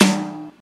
subtle reverb snare one shot g key, snare sound tuned to the G note